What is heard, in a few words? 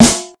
original acoustic snare a sharp key; snare drum samples